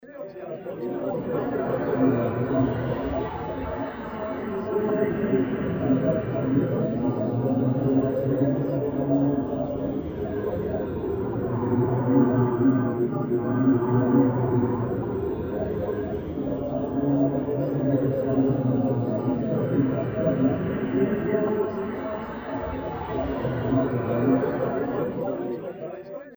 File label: vocal